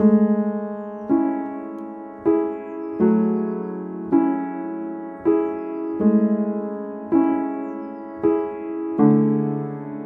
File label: piano chords dry; airspace hybrid reverb and delay; modeaudio